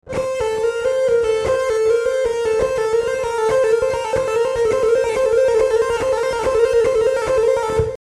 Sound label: legato